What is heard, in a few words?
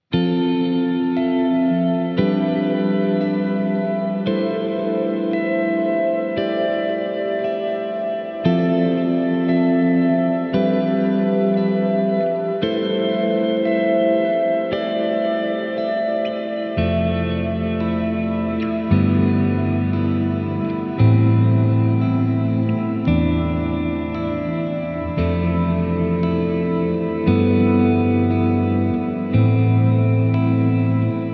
Guitar Play